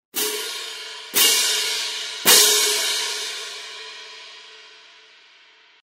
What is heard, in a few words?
Build, Sabian 18 HHX Synergy Medium Cymbals